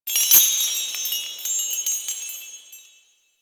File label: Shop Door Chime; Warframe; Eidolon Plains; Ostron Village